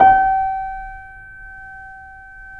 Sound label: C7 PP, The Ultimate Piano Collection; East West